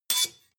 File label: sword hit scrape squeak soft